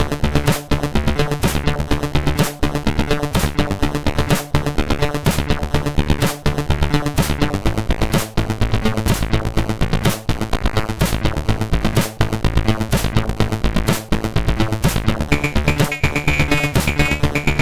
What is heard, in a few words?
Techno, sid CSG8580R5; hvsc; Moon